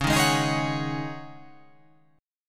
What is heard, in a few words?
C sharp Suspended 2nd Suspended 4th, C sharp